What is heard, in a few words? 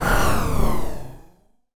Space Ship Fly By